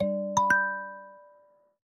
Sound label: player entered